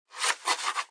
student write

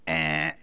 bzzt; SGI IndiZone 2

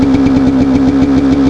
bike; Dirt Bike 4.3

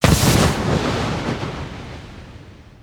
Water Splash; 1944 Battle Of The Bulge

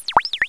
BLIP

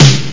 snare; alienbreed3d2

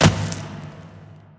hit warm hall; wob2 demo

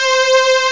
lead; The Epic Collection 3; Epic Marketing; muzak samples

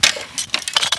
mg42 pack, weapons foley; Spearhead Demo; MacFormat 2003 June

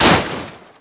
droid hit; DroidWorks demo

